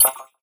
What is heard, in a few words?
Crystal Keys UI